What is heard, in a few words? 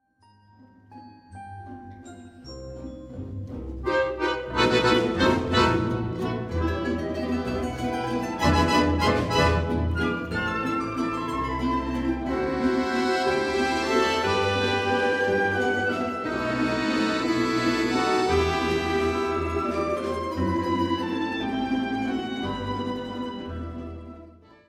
Russian Waltz, Balalaika